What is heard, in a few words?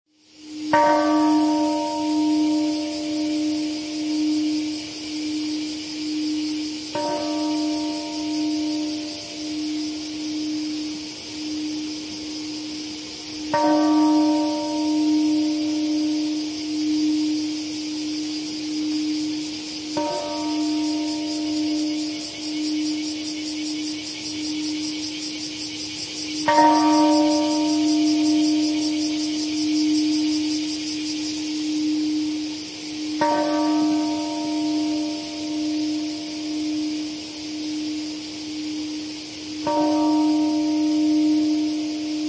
Bell; Hiroshima